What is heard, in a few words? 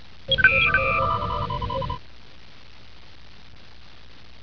ring out